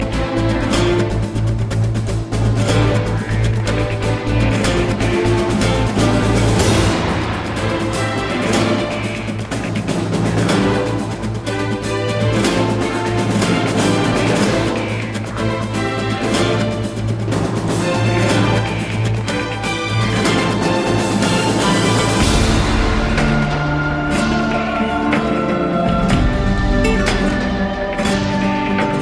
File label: Liquid Z3 - Acer Ringtones